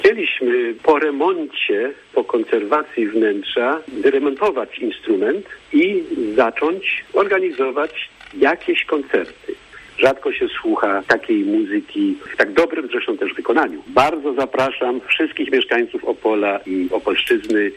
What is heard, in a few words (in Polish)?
organy katedra